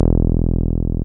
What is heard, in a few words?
303, TB-303 BASS